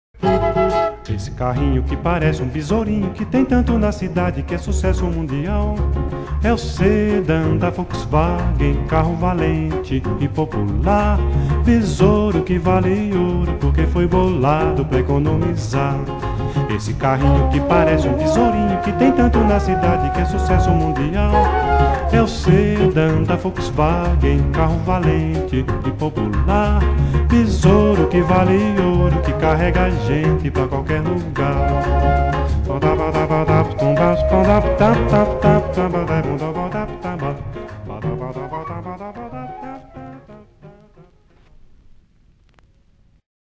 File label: jingle; sedan volkswagen